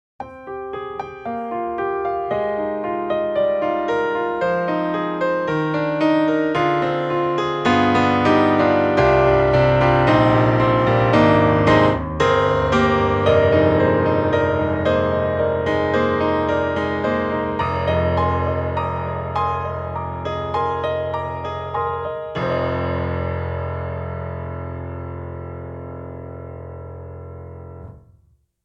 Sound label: Rosewood Grand Clean Keys; Orange Tree Samples; Evolution Rosewood Grand